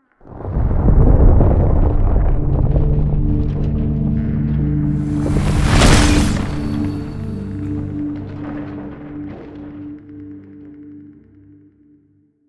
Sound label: sfx relic activation; the last baol; Stellaris; ancient relics